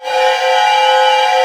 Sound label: cluster, Orchestra; Sound On Sound - Peter Siedleczek's Orchestra